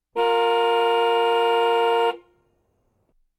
Car Long Honks

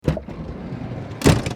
Auto Open Door Exterior

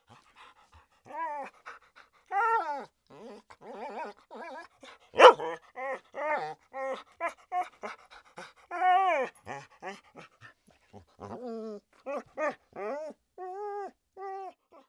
Dog Whine Large

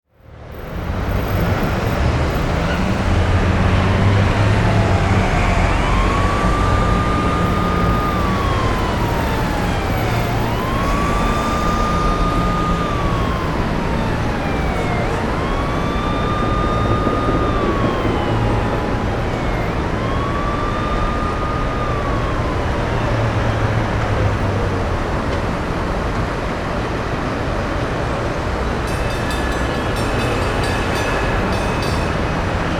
City

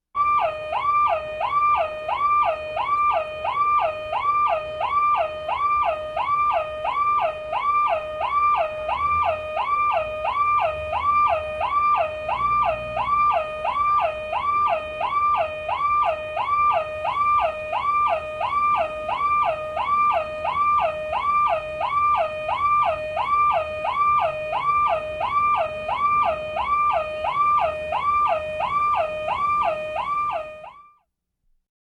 Domestic Car Alarm

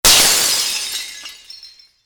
Smash Glass

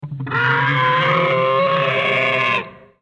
Dinosaur Scream

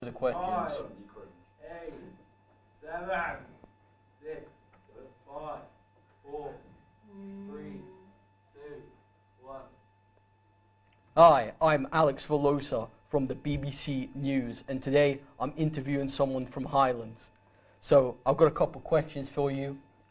Studio Recordings

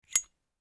Lighter

